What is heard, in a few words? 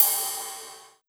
Ride, Cymbals